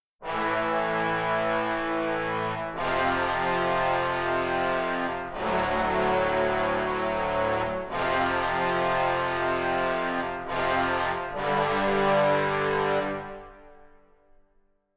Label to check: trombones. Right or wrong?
Right